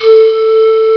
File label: m1 pan flute short, Amiga Soundtracker Sample Packs; ST-XX Amiga Soundtracker Sample Packs